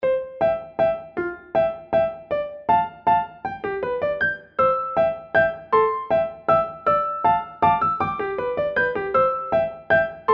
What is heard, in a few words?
kawaii piano